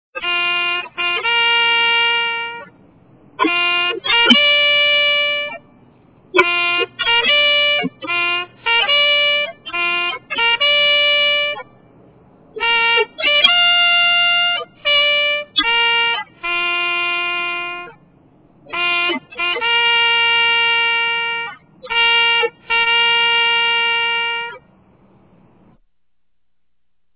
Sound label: military bugle